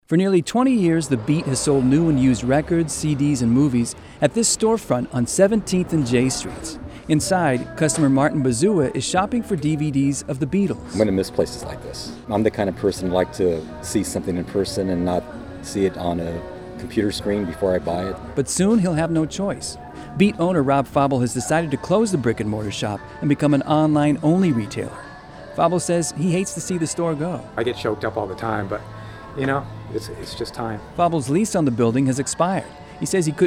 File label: beat